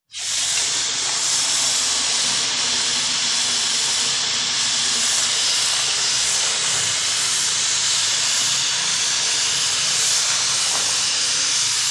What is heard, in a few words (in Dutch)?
Brandspoit